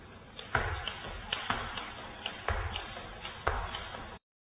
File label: bell, Single Rope; Gymnastics; 2 step; rope jump; ~nankichi